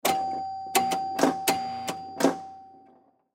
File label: Pinball Bumper, Pinball Bumper Hit Ball Ding; Arcades; Sports